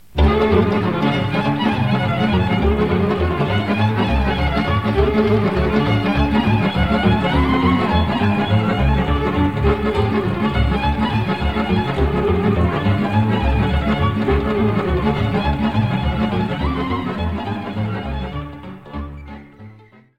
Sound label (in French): Sirba - Roumanie